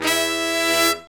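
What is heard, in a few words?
brass shorts, Brass Sections, Horns; R&R Sect; Roland LCDP06 Brass Sections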